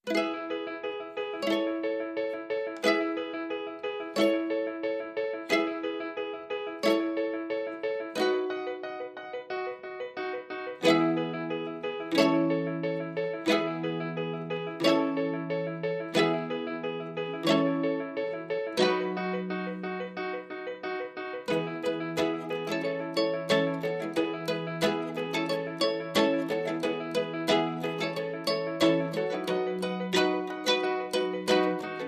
Piano Ukulele Mandola

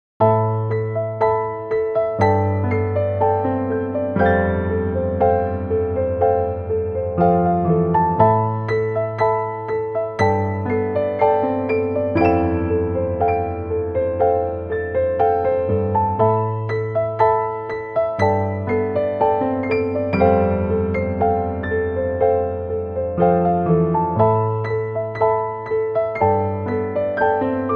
Piano Only

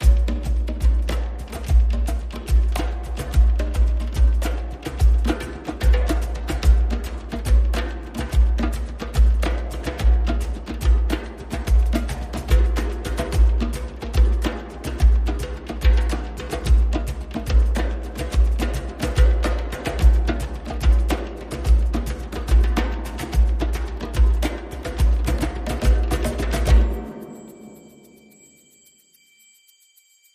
Perc and Pulses

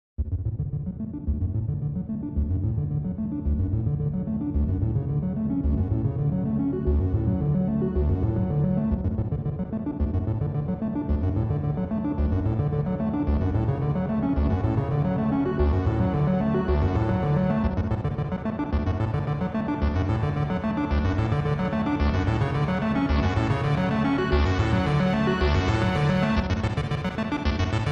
Arpeggio

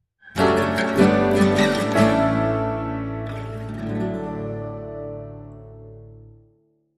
Fireside Jig, Link Sting

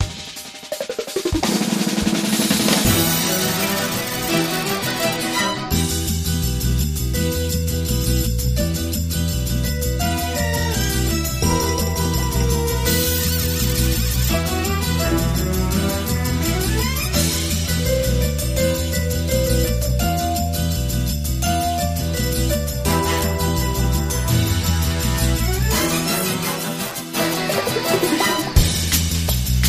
Pandemonium Underscore Less Drums

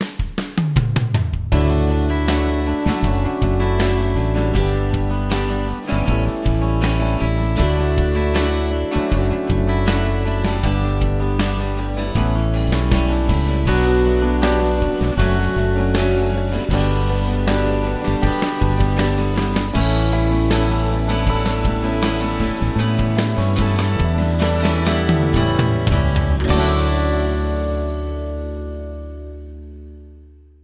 No Lead Guitars

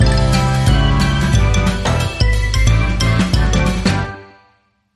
Laugh Out Loud

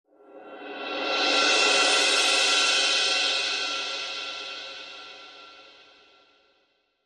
Quick Roll

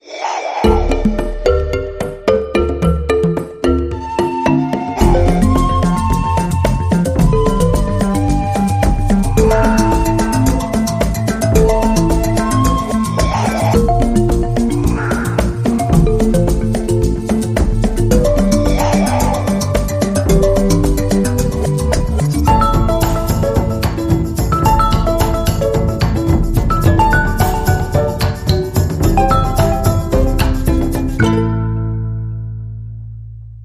Percussion Party

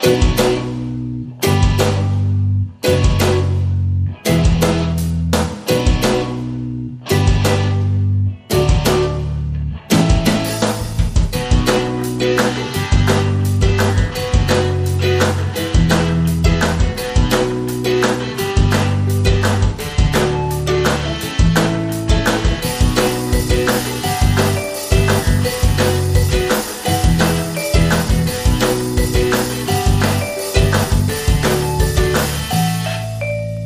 No Vocals

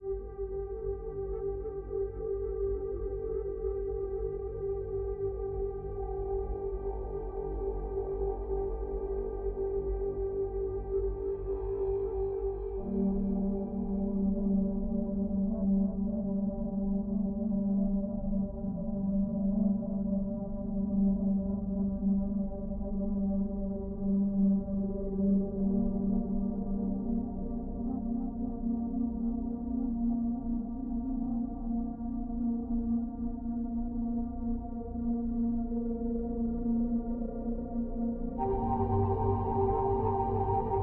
Drone, Light

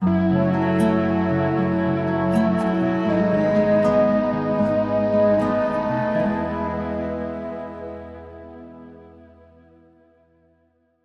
Sting 1 Acoustic Ver

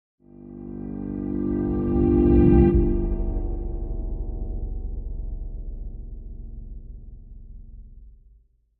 Banjo Roll Pulse, SFX Rises

